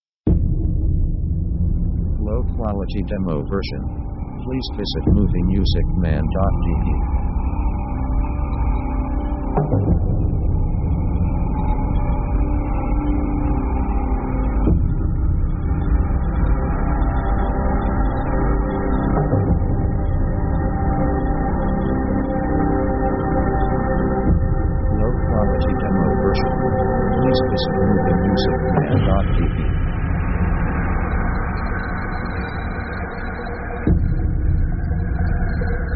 horror atmosphere; synth themes

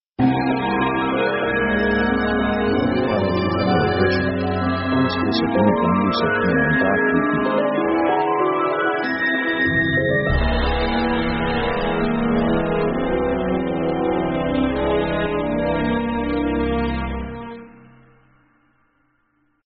orchestra big spooky; orchestra themes

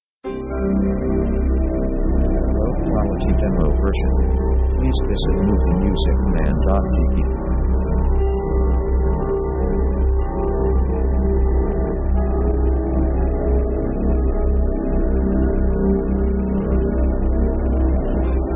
atmosphere dark; synth themes

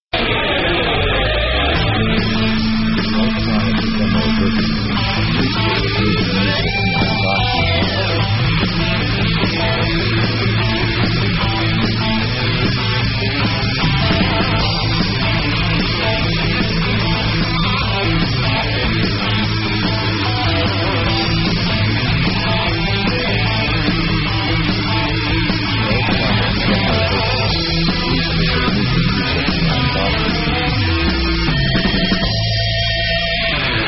metalic

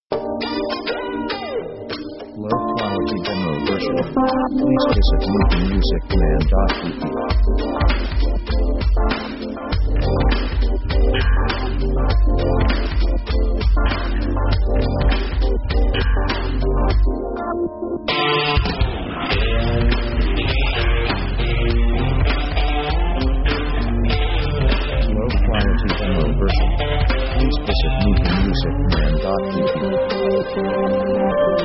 ASIAN BEAUTY

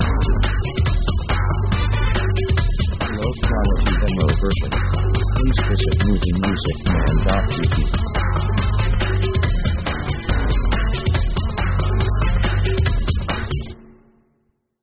mid east marching; Action themes

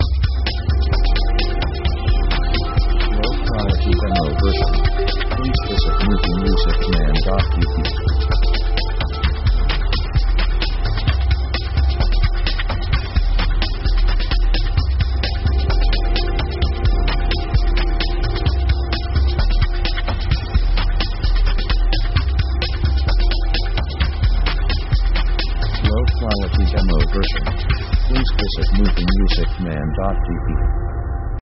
jungle kontemplation G 130bpm; synth themes

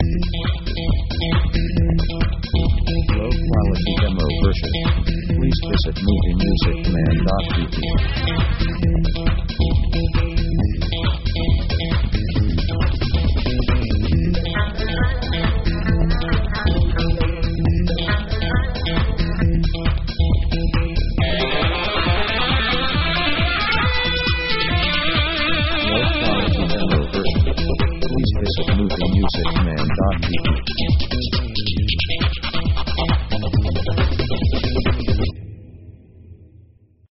oriental house, synth; synth themes

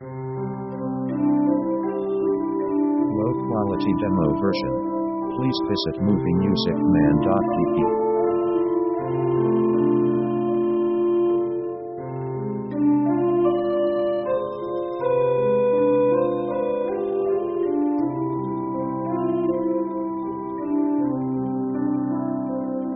orc epic peaceful

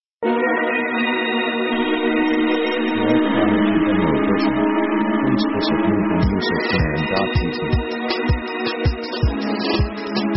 triphop; synth themes

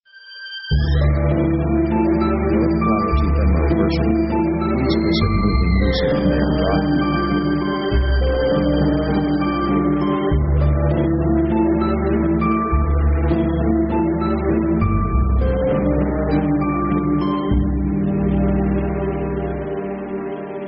ADVENTUROUS PROGRESS, orchestra

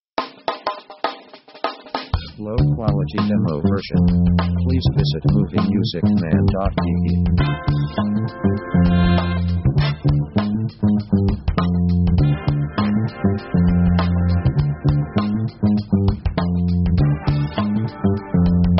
funky walking; easy themes

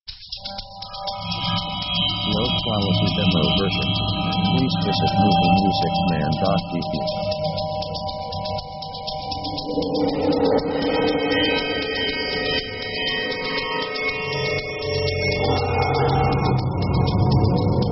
thriller action; synth themes

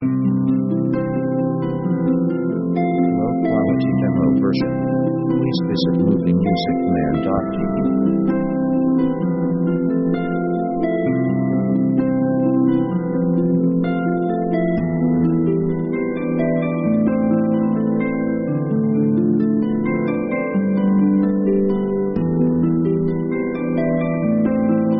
pop friendly harp 65BPM

